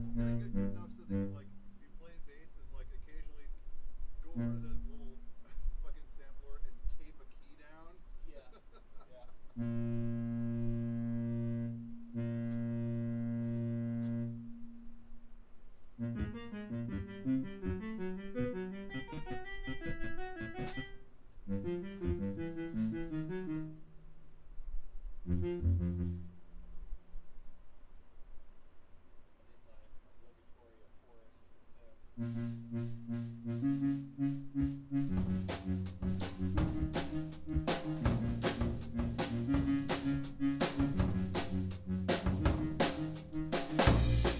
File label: noise session